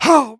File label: Spy pain sharp